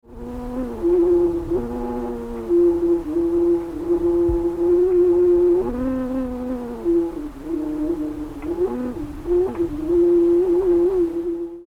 plastorgue grave

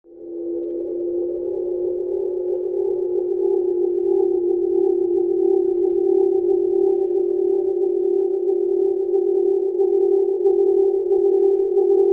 calebasse

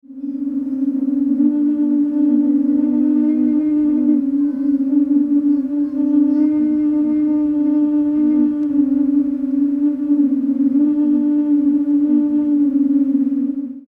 orgue bambou grave

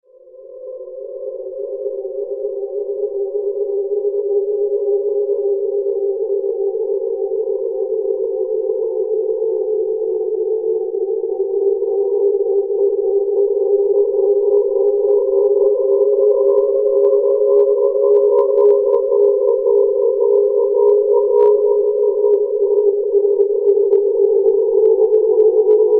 calebasse

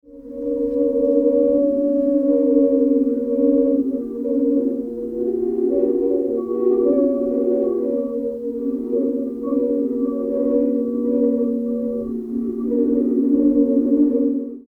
ensemble orgues bambous

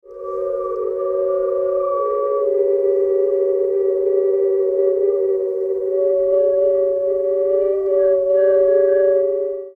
orgue bambou aigu

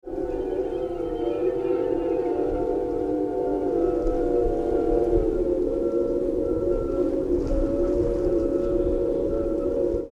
chorale plastorgue

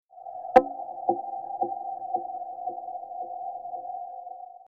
harp dyn echo

